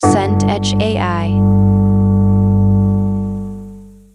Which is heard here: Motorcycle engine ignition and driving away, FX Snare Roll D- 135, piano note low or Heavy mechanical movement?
piano note low